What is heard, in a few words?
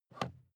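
sports car interior pedal clutch release soft; verkehr transport; autos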